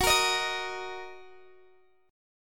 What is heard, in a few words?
Gb 6th Gb down Guitar Open D